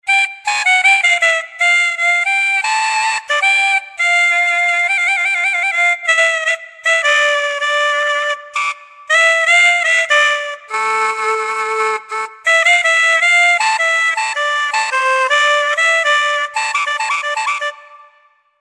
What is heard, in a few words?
artificial wind solo soul